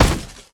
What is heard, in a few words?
step walk